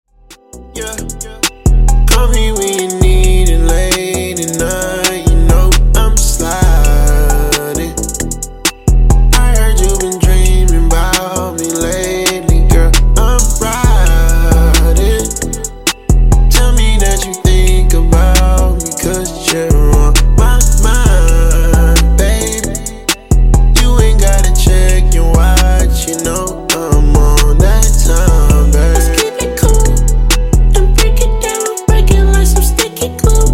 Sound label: 67bpm; Redrum